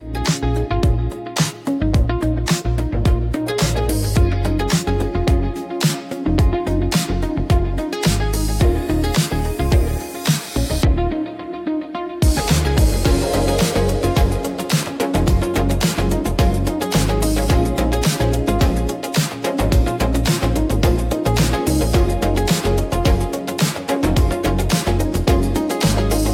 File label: merchant town; business music pack